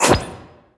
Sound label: hole open; littlehorn